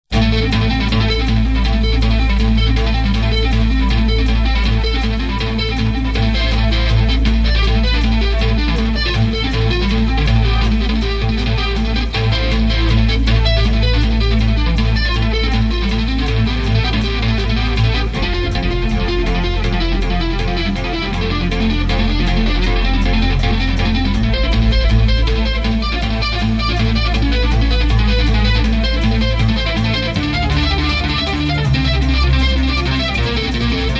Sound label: guitar solo